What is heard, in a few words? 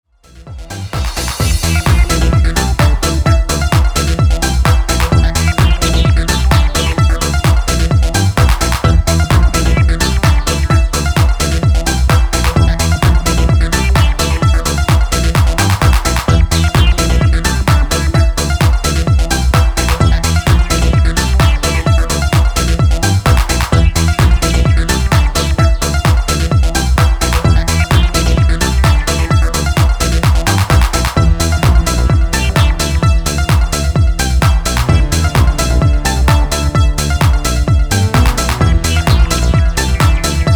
Saw Curving, acid